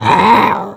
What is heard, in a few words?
death kobold common, creep deaths; dota